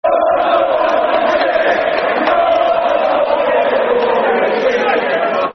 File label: Your Support, Football Chant (Soccer Song); Newcastle United Nufc; fanchants-com